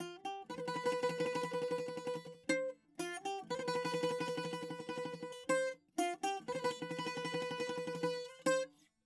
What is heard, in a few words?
bouzouki